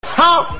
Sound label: huuh